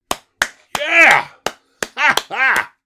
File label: Elderly man happy cheers and applause; misc human